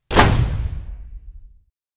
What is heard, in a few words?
Explosion